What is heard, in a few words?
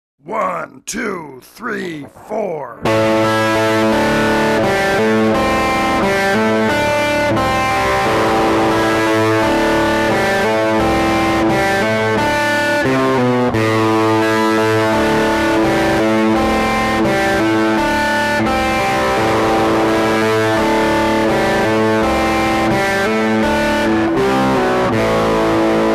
Rhythm Guitar